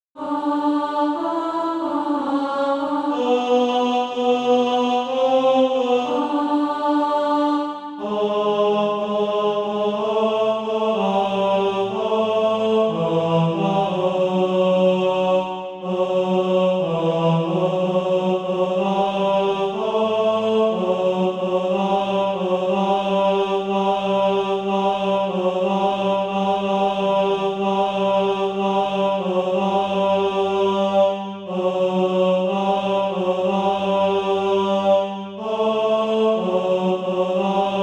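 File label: tenor, Practise Music; Firm on the Rock I Stand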